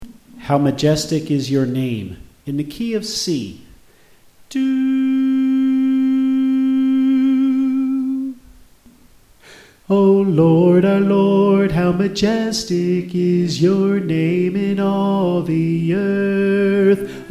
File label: Soprano